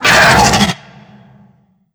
pain; gonarch

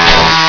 chain hit, weapons

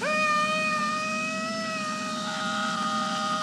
screams

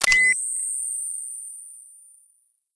charge, weapons

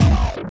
loop

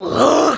pain

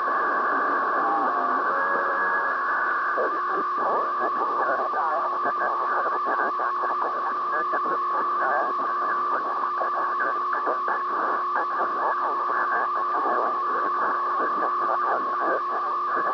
comm amb, ambience

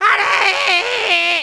death; fatbuu

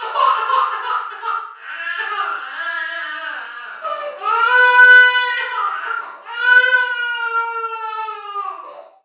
baby cry

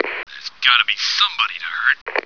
hurt

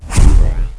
health

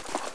satchel radio fidget

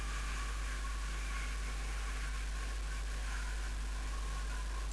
noise

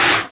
fire extinguisher end

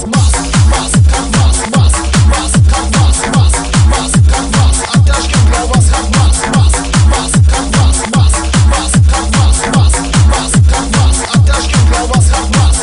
hard bass

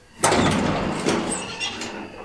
slide close

